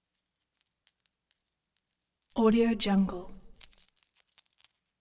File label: coins or keys jingle